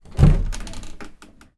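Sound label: door cupboard built in open kitchen utility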